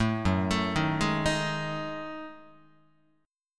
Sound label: F sharp Minor 6th Add 9th, Guitar; arpeggiated; JGuitar